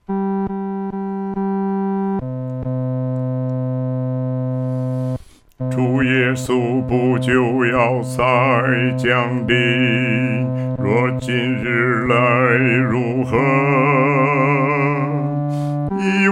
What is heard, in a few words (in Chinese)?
独唱 第四声; 主若今日来如何？